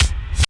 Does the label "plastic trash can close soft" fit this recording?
No